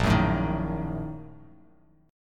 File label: Bb-Major 7th Suspended 4th Sharp 5th; strummed; JGuitar